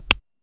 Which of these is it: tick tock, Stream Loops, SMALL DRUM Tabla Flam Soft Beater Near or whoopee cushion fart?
tick tock